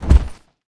bear foot front

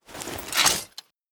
axe 1 hand draw

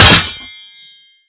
blade 1 hand parry

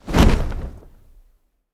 dragon wing flap

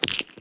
gem up; gems

